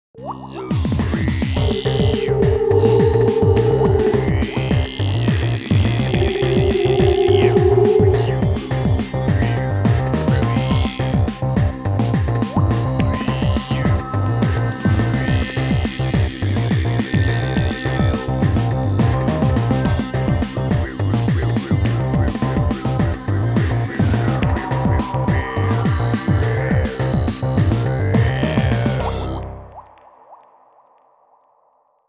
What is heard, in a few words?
Asian Desert